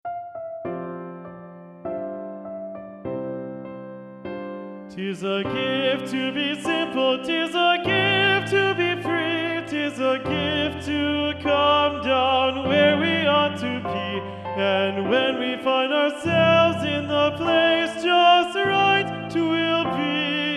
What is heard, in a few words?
high tenors, c major; simple gifts